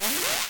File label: launch; World Of Goo